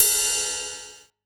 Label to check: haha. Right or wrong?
Wrong